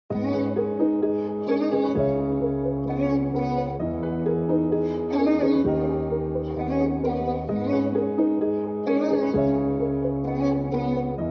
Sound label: melodic type beat trap beat